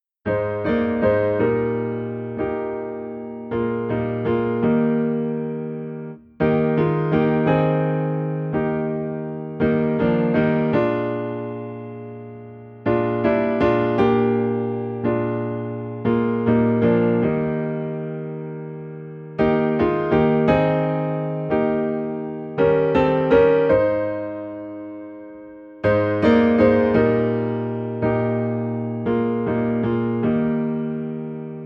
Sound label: Piano in E Flat